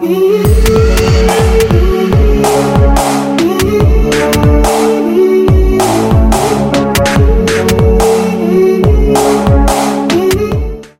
duel; jb engine

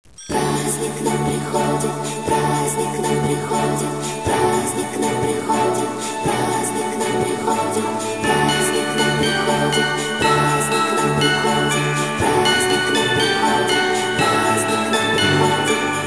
no one win

